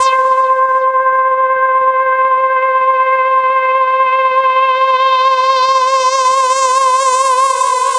Rise hard; Cэмпл синтезатора; Rock Alternative; 120 BPM; loop; Synth; рок

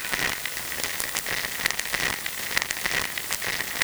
Vinyl noize

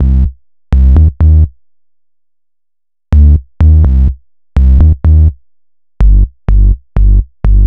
Corrosive Bass

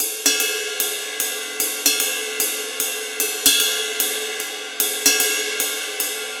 Ride Cymbals, регги

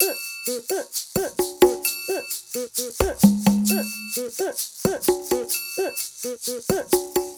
Bossa perc B; Latin (Латино)

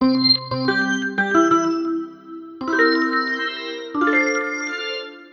EP Synth; electro piano

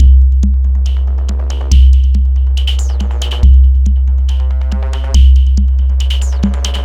What Bass, dubstep; звуковой эффект

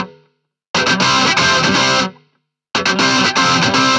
Brit B

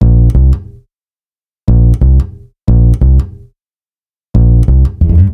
Finger, hip-hop

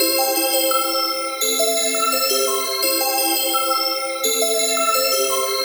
Future Bells

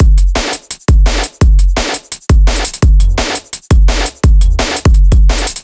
Rumble Beat A, 170 bpm

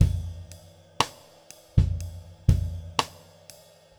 Half feel B; Jazz (Джаз)

